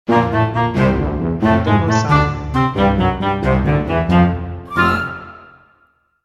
Jolly Snippet Clarinet Tuba

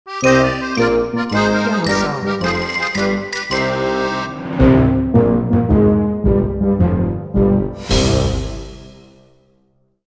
Xylophone Accordion Brass

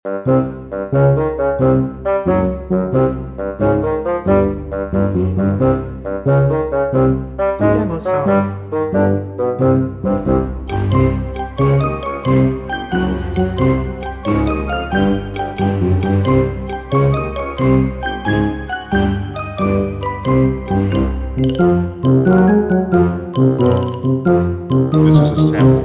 Mischievous Deeds, Bouncy Loop

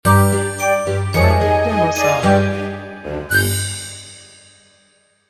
Flute Gliss

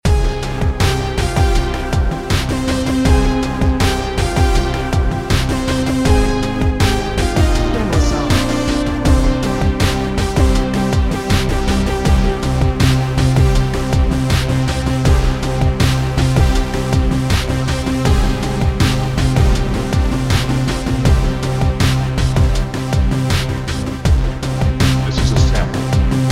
60s Loop; Top Hiscore

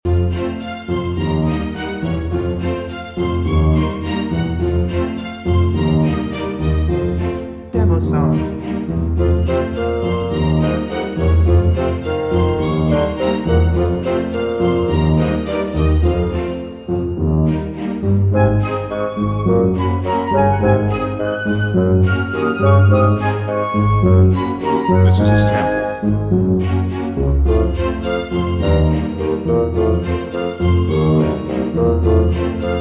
Loop Flute

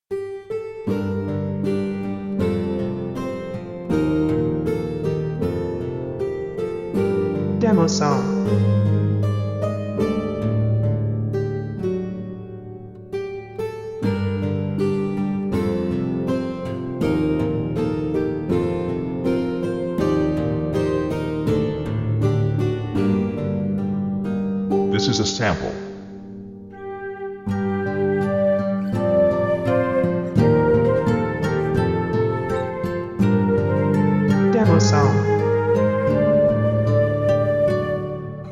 Dark Side Flute

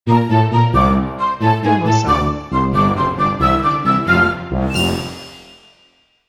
Jolly Snippet Flute Tuba

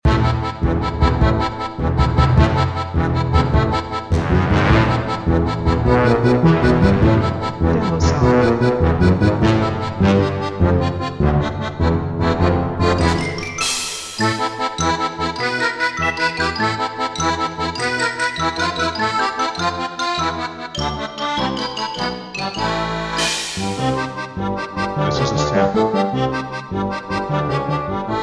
Funny Hectic Loop

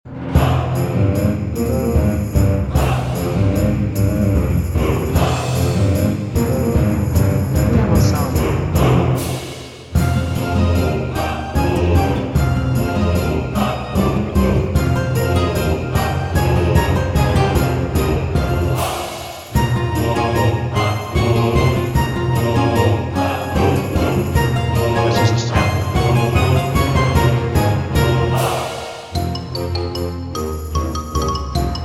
Pirates in the Bay Choir with Intro Shouts

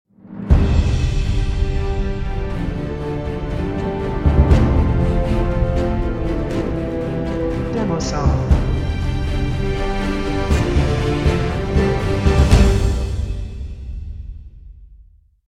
Majestic Epic Scenario Success Happy Ending

